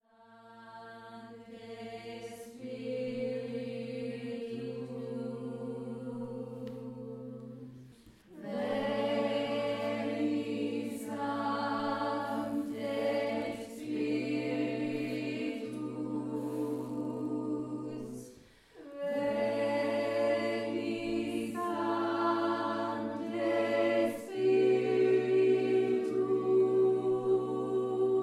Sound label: Chant Workshop; Veni Sancti Spiritus